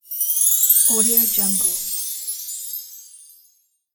magic dust chimes

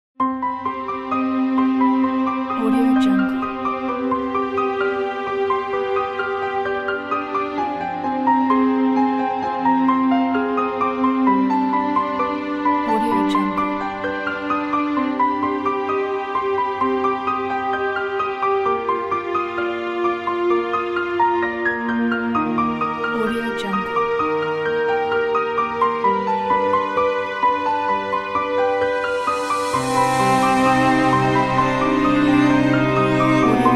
sadness piano and strings

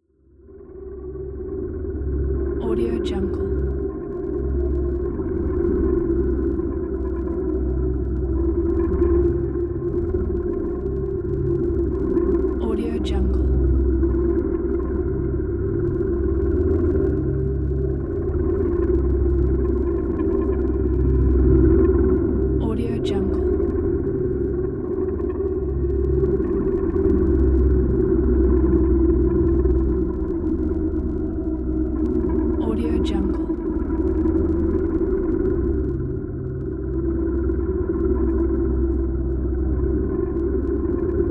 creepy ghostly ambience

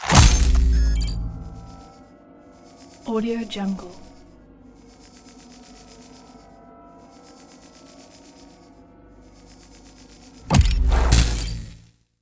utilities shield

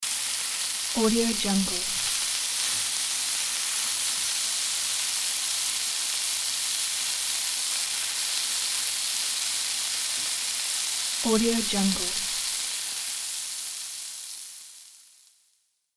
pan frying and sizzle

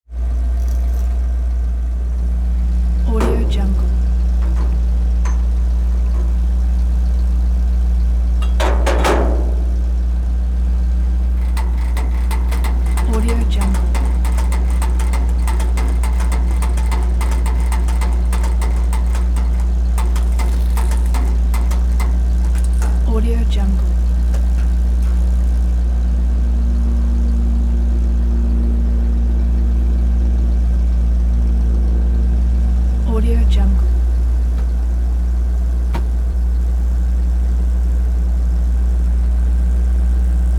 truck idle fuel pump movement

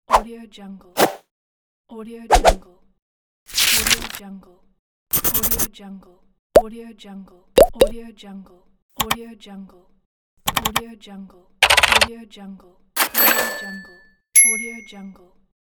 infographics sound pack

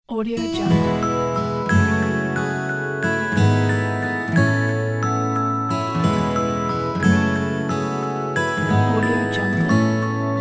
guitar acoustic logo

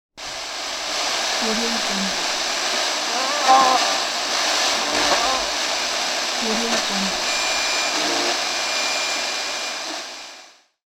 radio interference; rm-sound

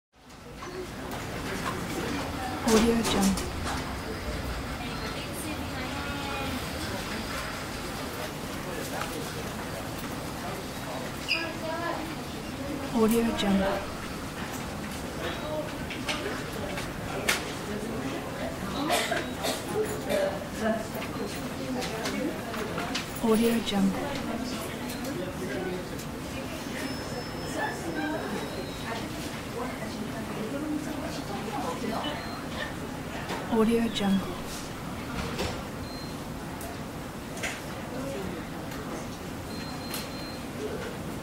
general nurses station ambience

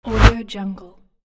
whoosh movement

